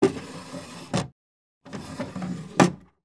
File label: Wooden Drawers, Open, Close; lilesound